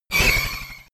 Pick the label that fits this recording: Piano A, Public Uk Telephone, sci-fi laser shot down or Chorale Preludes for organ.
sci-fi laser shot down